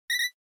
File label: NES Racing Tire Squeal